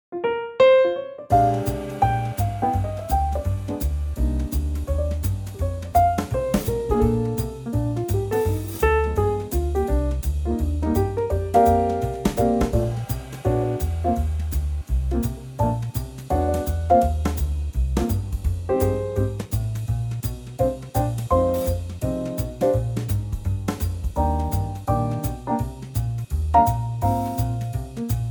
Bb swing

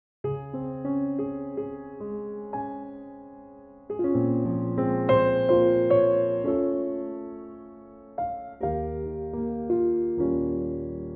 Db piano

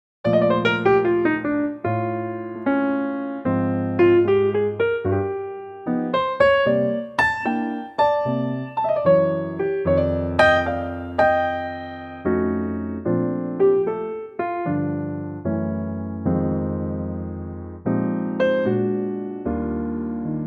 Bb piano trak

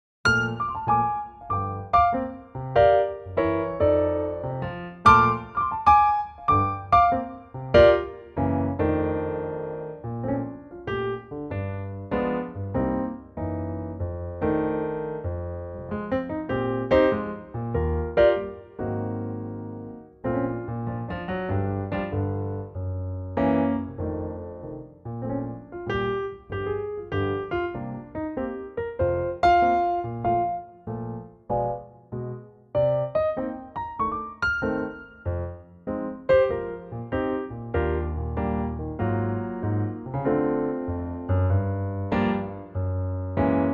Bb piano

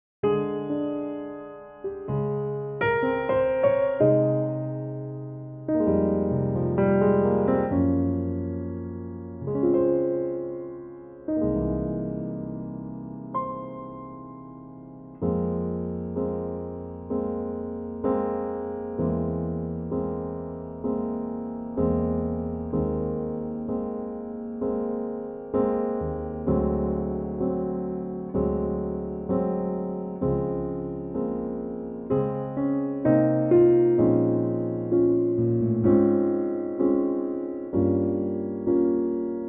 Fm piano

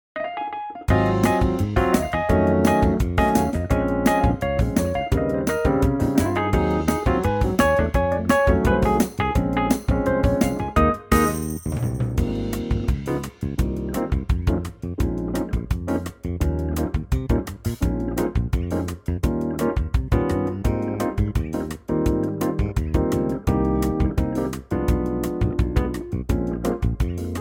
Ab groove

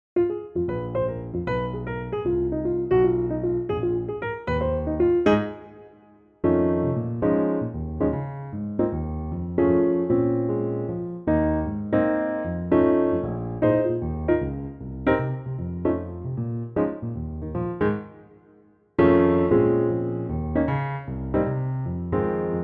Ab piano

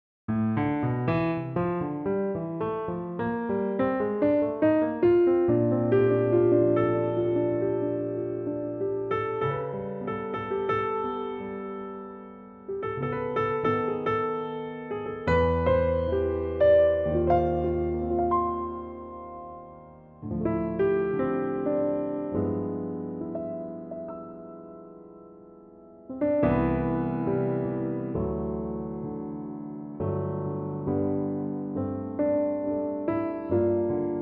piano trak C

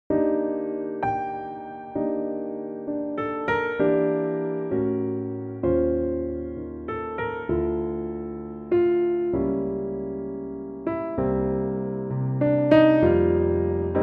Bb piano

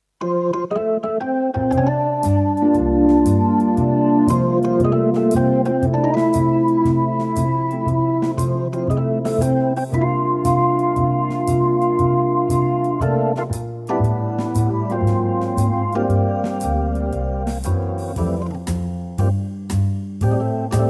organ

